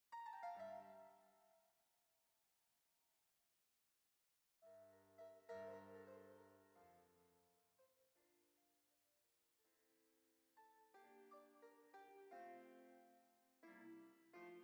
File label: Mozart Ambisonics LS